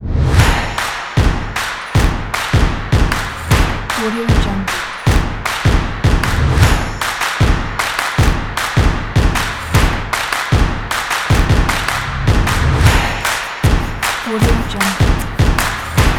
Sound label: football soccer drums